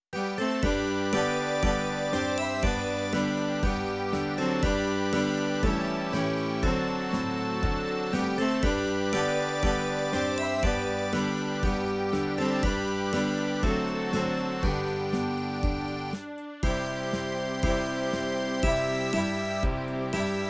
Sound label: orch